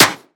clap c sharp key, Royality free clap tuned to the C# note. Loudest frequency: 3959Hz; percussion; single hits one shots